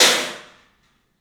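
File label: CLUB CYMBALS; 3D Sound; 3D Drums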